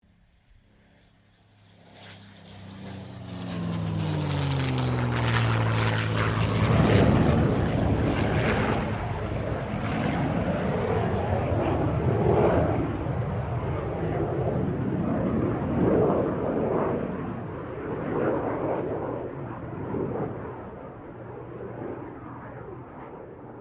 AIRCRAFT MUSTANG HORNET PASS; Mixed aircraft squadrons; Airplane; Vehicles; Aircraft Collection

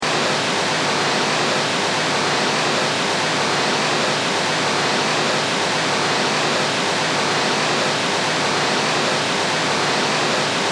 Technology Television Static; Electronics; SFX Bible

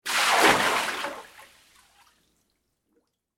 Water Pour Into Tub; Pouring; Sound Effects:Objects; BlastWave

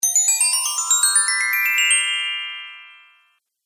Rise And Chime Game Music Fx; Video Game Sound Effects

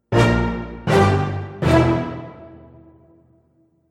Action Film Dramatic Event, musical

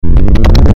Ascend Static Distortion Crackle Warble; Blastwave

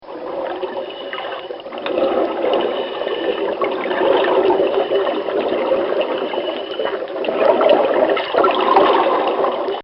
Scuba Diver, Water; Nature

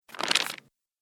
Turning Paper Pages; Paper Sound Effects; office

